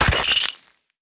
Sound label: Death Bug; we are the dwarves gamerip 2016